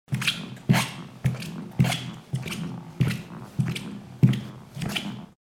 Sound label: steps on rubber